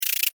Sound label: Insect Voice Chirp, Insect, Voice, Chirp, Vocalization, Bug; Sound Effects:Animals:Insects; Blastwave